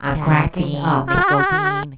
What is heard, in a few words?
cracking up